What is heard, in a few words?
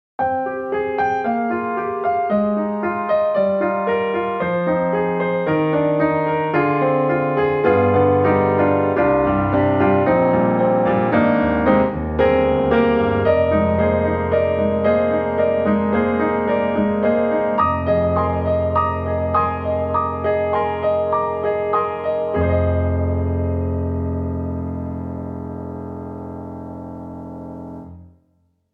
Studio Grand, Arena Ballad; XLN Audio; Addictive Keys